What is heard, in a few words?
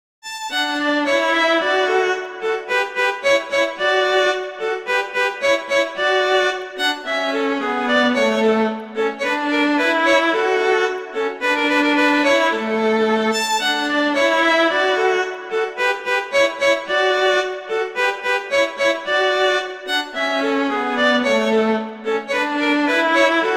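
Duet, violin, viola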